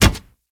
Kubrow Run Metal; Warframe

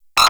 Sentient Sniper Mech Low Ammo; Warframe

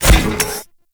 Step Default; Warframe; Corpus; Ambulas; Footsteps

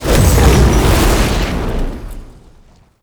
Convo Start; Daklo; Warframe; Tau; Betrayer

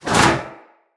Shield Whoosh; Weapons; Swords; Dendrit Sword And Board

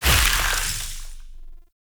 Void Release; Duviri; Incarnon Adapters; Weapons

